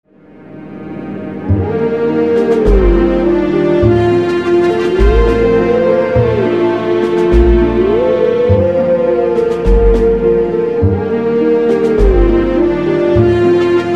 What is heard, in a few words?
wolf song; twilight princess